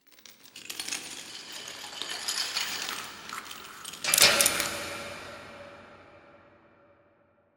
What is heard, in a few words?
Metallic Chains, Production, Element, Metallic, Chains, Lock; Warped; BlastWave